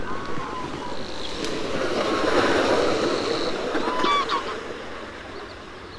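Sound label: eurasian teal, birds in flight; karrend wiesen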